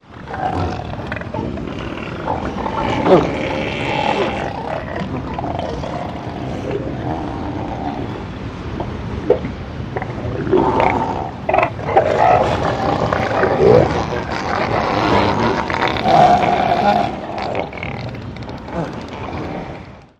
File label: Seal, Elephant Growl, Snort. Multiple Growls, Snorts With Ocean In Background. Close Perspective.